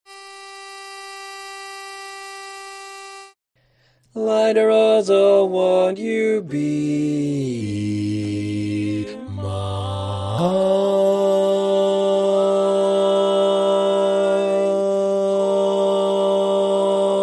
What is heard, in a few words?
Bass, barbershop